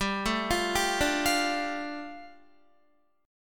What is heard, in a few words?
G 7th Suspended 2nd, Guitar; G7sus2 arpeggiated